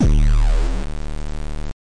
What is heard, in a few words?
kick, drums single hits; iElectribe Kicks